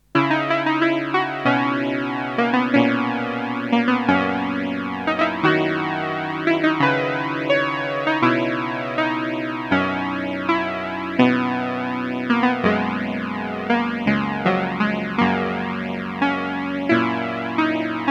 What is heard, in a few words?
Photon Explosion, ARP Quartet; Musical Showcase